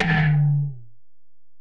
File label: Tom, Alesis DM-PRO